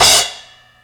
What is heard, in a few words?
Splash Choke, Cymbal; KJ Sawka; Drum n Bass & Beyond; Drum Tool Box